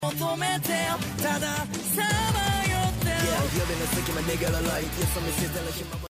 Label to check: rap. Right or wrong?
Right